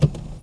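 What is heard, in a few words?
gear down, engine; AssaultCube; Alien Stars; American Muscle Series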